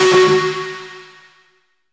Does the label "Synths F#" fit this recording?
Yes